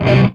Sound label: CHUNK C, HEAVY METAL; Greytsounds